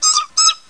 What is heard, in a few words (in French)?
oisillon; MOBICLIC; GARE